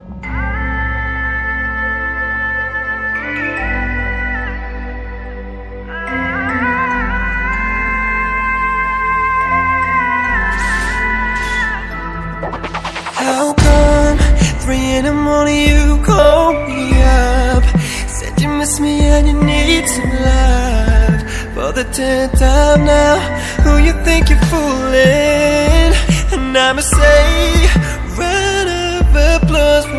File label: Somali music